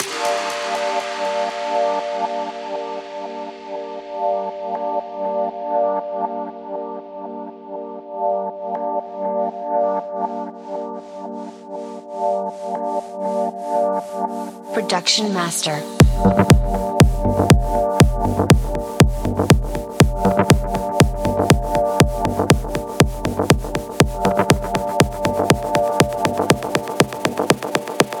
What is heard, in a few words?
Berlin Techno; Production Master; Selektor